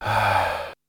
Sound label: Breath